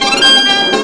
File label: Flourish, Trumpet; TrumpetSFX